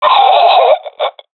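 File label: death, professional female; vo; agents; Source Model Packs; Counter Strike Model Pack; CS2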